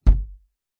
foley balloon inflated hit thud; zapsplat

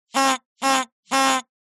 cartoon honk horn or whistle

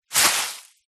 cassette vhs tape massive amount loose pick up; household

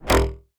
sound design stubborn ping; dustyroom

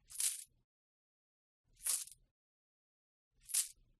FOLEY CHAIN MAIL Armor Movement; smartsound

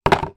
card box empty small down on table; zapsplat

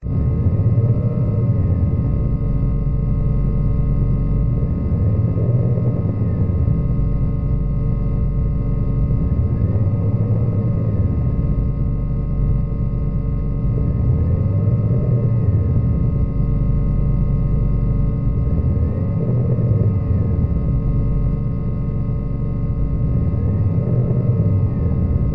science fiction ship power room; Audio Mangler

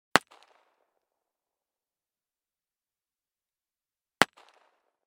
gun 1911; weapon; still north media

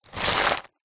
paper bag small movement; foley

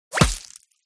BULLET Small Impact Body; WEAPONS

